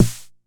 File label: Snare 909; gate experiments